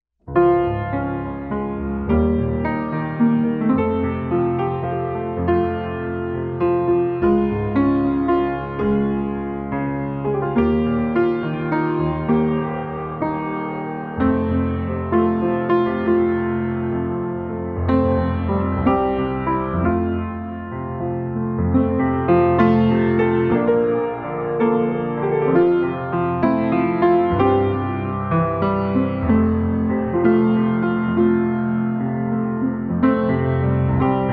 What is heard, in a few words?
Processional Bridal Chorus